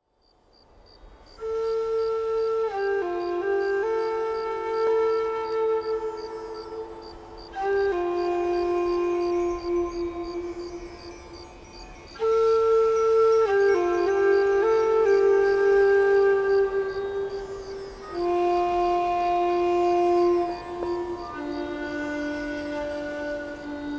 Crickets Call the Spirits, Nature Sounds with Native American Flute